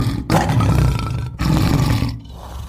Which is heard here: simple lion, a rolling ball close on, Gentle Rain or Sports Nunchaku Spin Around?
simple lion